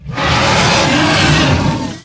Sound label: charge, weapons